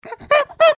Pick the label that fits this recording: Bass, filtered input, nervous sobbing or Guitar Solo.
nervous sobbing